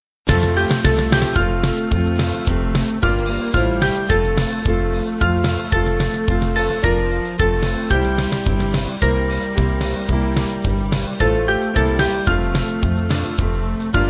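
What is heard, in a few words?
Spiritual Band, Free Music Backing Files for Churches; There Is A Balm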